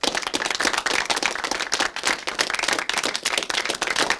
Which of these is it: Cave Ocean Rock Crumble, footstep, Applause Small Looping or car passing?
Applause Small Looping